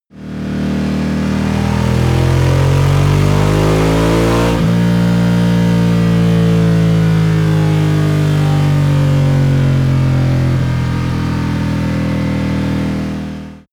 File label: motorcycle ducati cruiser accelerate decelerate short